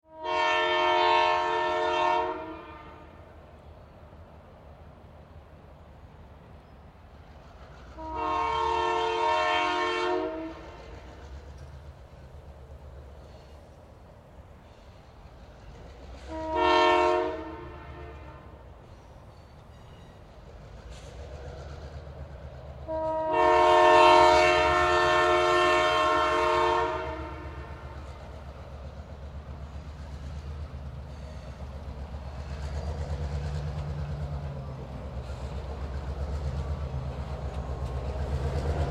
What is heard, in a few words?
Vehicle Train Approach Pass By Multiple Horns; Trains